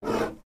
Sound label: Swan Giant Breaths